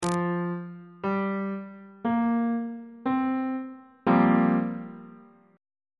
b sharp dominant 7th suspended 4th 1st inversion on bass clef